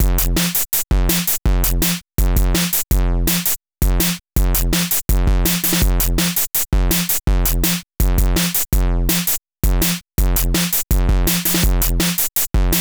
808 destruction; black birdie04

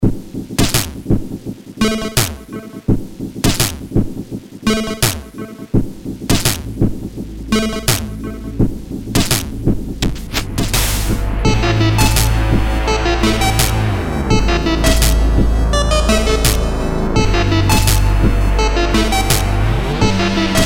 holy drum roll; payback15; dascon

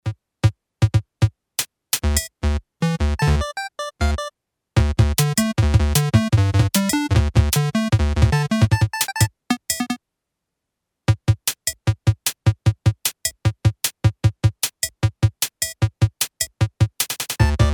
toysynth remix; arok18; grand prix circuit

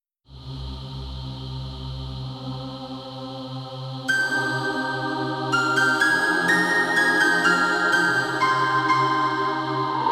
electronic birds; assembly10; song of the phoenix bird

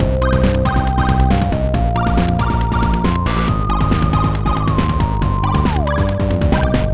chiploop; evoke09

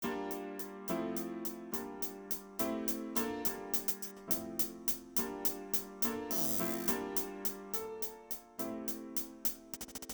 fast music 210 bpm; assembly20

